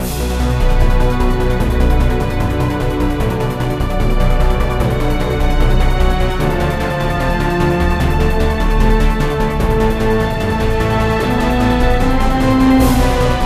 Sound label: Battle Sequence; mass effect 3 citadel gamerip 2013; Arena